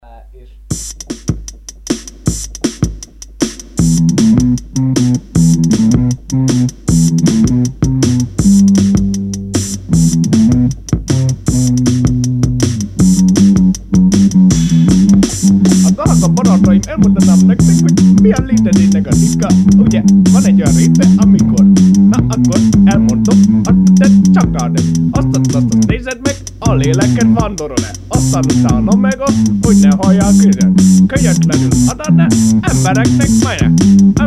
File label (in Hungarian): indiai drum n bass